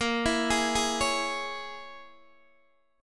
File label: A sharp Minor 7th Flat 5th A sharp, Guitar DADGAD